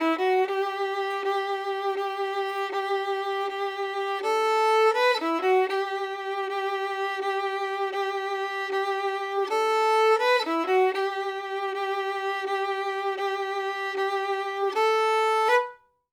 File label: violin